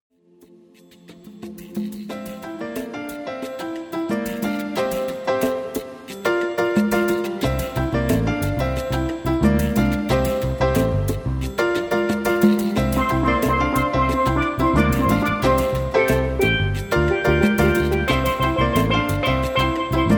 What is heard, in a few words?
caribean steel drums